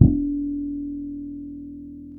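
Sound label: D 4, fretless harmonics, bass; Spectrasonics; Bass Legends CD 1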